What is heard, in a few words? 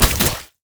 minefield lan; LoL; ziggs poolparty